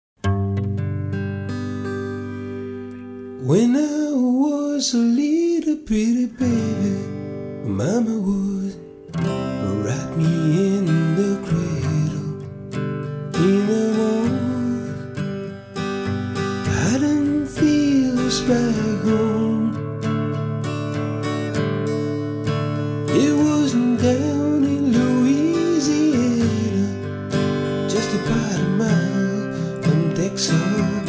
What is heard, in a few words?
Acoustic